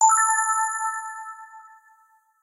frameprogress; rome

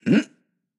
reaction hm; goldentavern

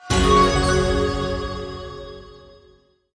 small Win; fireflies

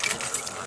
win Loop; eggomatic

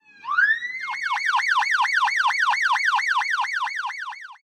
expanding Wild Police 7up; hotline2

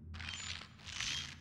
picker idle; fairymirror

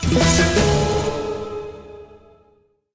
medium Win; pyramid

